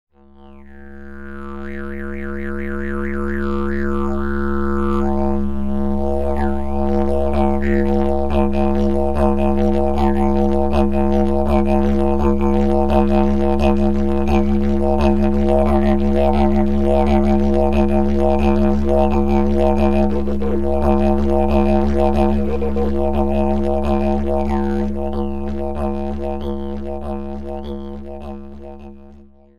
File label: Didjlight, Didge, Ton: C#; ...mal Lampe, mal Didge